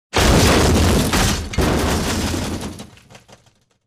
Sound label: Car explosion; explosions